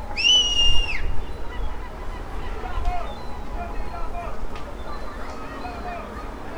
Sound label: man whistling - distant; city